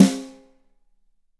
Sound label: 4X15 BRUSHES; Big Fish Audio; Ross Garfield Drums VOL-2